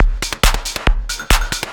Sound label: percussion loops 138, Techno; PS-45